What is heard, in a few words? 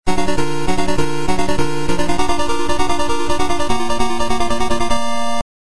Game Over Music; Tron; arcade